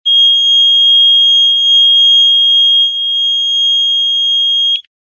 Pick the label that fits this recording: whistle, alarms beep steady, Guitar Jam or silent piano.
alarms beep steady